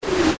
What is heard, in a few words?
Panel In; AOL